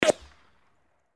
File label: ricochet bullet high pitched left right; hollywoodedge